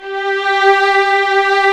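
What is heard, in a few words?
strings, 8pc; String Master Series; Vlns 2-5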